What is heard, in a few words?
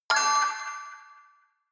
notification signal; kommunikation; signale